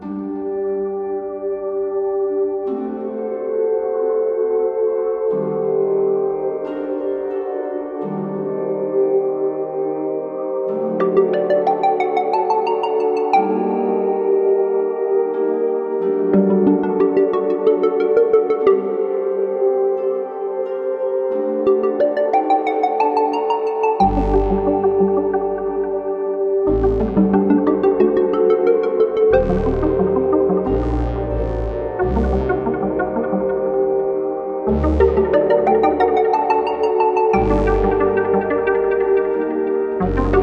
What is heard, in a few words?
Loop 180bpm; jenny leclue detectiv